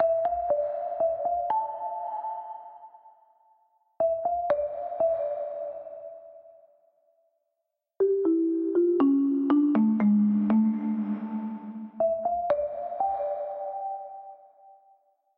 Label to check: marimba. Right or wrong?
Right